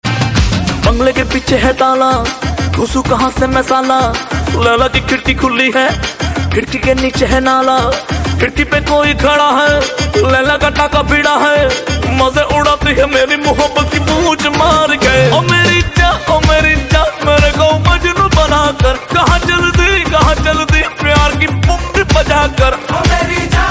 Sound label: Pungi Remix